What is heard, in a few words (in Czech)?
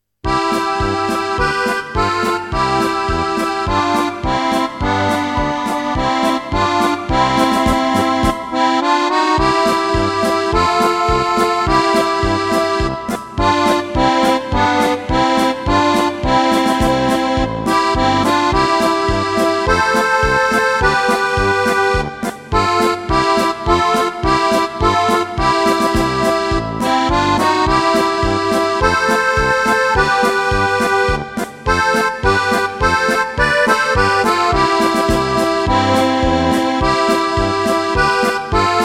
polka